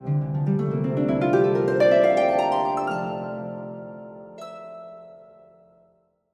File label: Harp F maj phrase chromatic ascent; Artlist Original